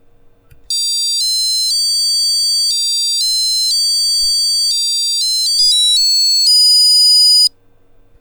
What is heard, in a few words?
rec doremi, raspberrypi3 buzzer